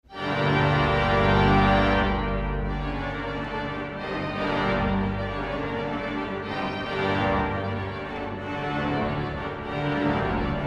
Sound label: Fanfare in G-minor